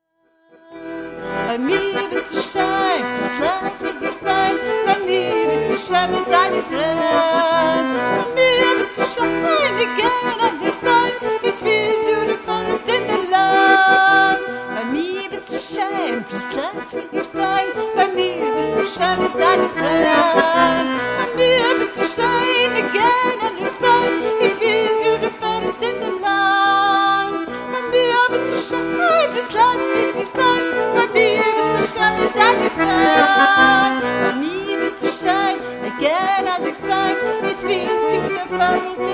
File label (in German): Akkordeon